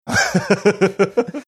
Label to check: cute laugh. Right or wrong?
Right